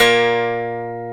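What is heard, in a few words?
A#1, MINI 12 GUITAR; AKAI S-Series CD-ROM Sound Library VOL-7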